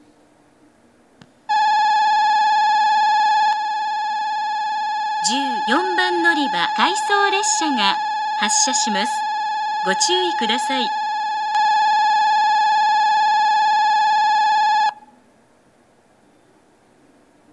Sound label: stop; 博多駅 14番線; 発車放送; 回送; 回送列車です。; hakata; station; jr-west